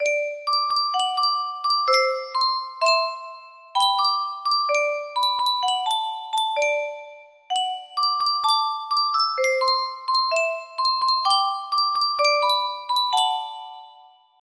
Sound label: yunsheng music box, melody